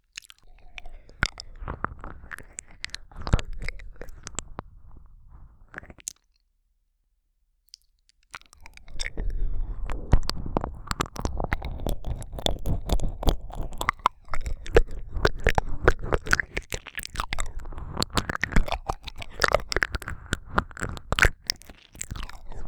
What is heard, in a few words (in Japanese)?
ちょっぴり激しい耳舐め, ASMR